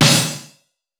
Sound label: Jungl Crash, Cymbals; Roland BK-5